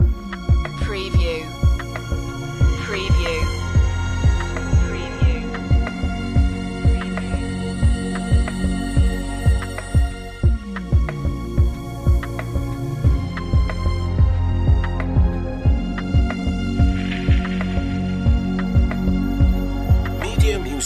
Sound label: loop; swell